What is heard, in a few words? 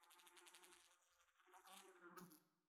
insect; netent